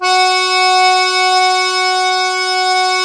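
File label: DIATO F#3, diatonic accordion, Historical Instruments; AKAI; USB Soundscan vol.23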